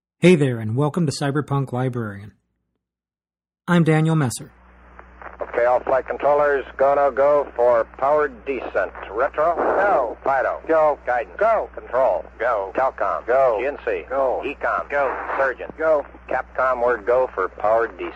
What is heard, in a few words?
workplace ambience; Cyberpunk Librarian